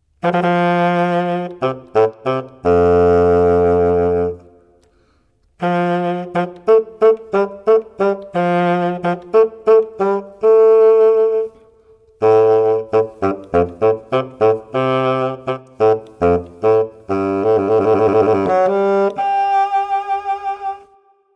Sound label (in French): Basson